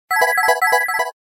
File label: Bell; Samsung SCH-R100 Stunt MetroPCS